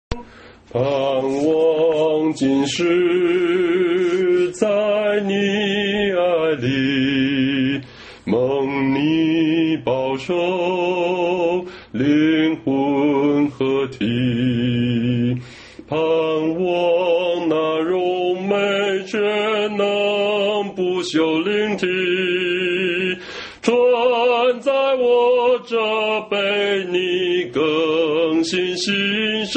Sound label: hymn; worship